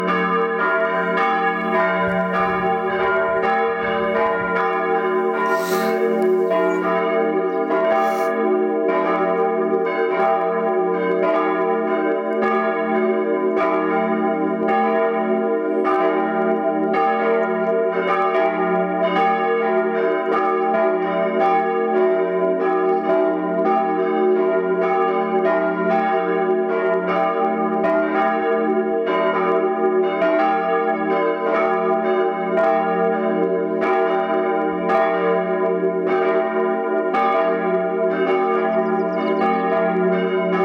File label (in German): glocken reformierte